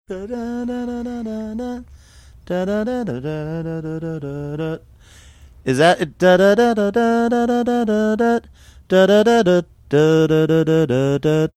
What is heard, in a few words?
singing melody of song while trying to think